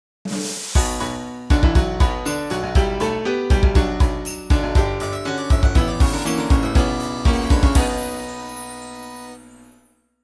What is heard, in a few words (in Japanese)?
さとりジングル